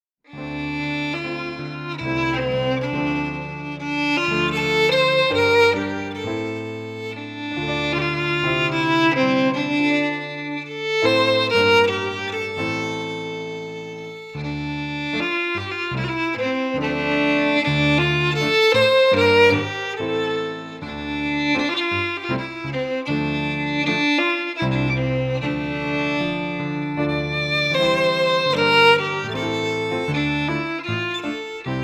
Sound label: FIDDLE PIANO; Jink & Diddle Book o' Tunes 2022